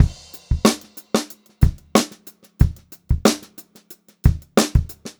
Funk-Groove Drumloops

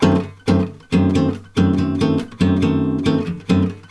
GB DIM GTR, Brazil Bossa; USB Soundscan; AKAI

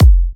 F, kicks; markus hakala kicks

